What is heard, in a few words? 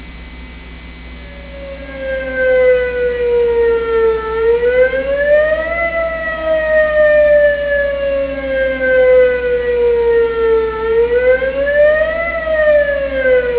Siren test; police